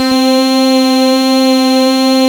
C4, synth line; 90 s Sample CDs; NiceBeats; SynthLine VOL-3

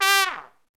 SOLO TRUMPET

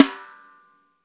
LOFI, snares; Spectrasonics; Burning Grooves

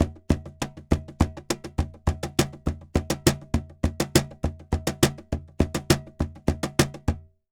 Cajon 136; Best Service; Festa Latina VOL-1; Drums & Percussion

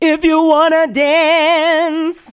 FEM HO, Female Hooks

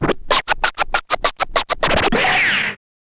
scratch short, Vinyl scratches

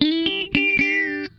Funk Guitar; Zero G - Funk Guitar

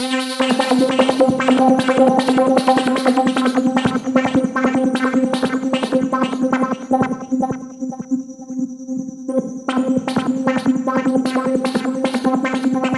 C4, Synth; NiceBeats

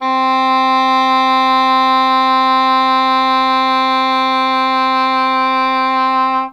English Horn C3; Standard Instr

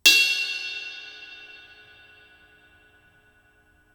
Crash Bell, drums singles; The Roots Drumloops

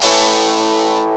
fuzz guitar; CMI Sound Library Rev 1.3; GUITARS

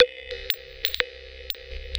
Structured Rhythm, IDM Rhythms

